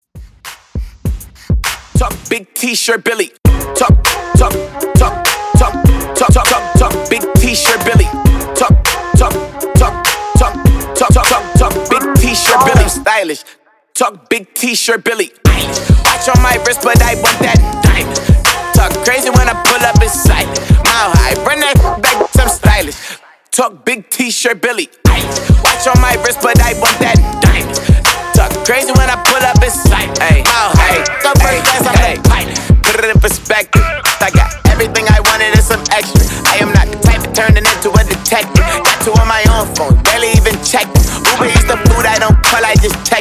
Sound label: Top40 RB ReDrum 100 BPM